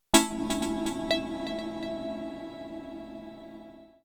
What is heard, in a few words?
Bright, notifications